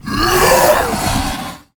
Chromatic Prototype Attack

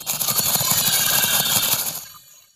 Glitter Moth Play

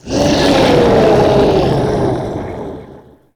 Unbound Elemental Death

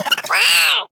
Vibrant Squirrel Attack

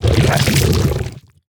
Seeping Oozling Attack

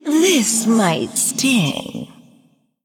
Female Undead Attack

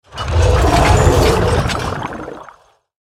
Mecha Shark Play

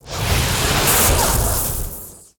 Lightning Underlay Death

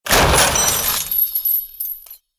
Treasure Chest Death

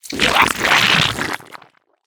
Slime Attack

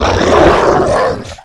Barghast Play

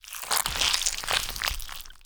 Attack Underlay; Expired Merchant

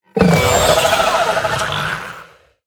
Imprisoned Vilefiend Death

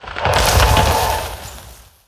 Glacial Shard Attack

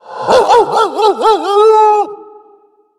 undead beast; Arfus

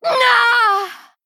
Female Blood Elf Death

